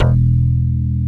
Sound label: PULL BAS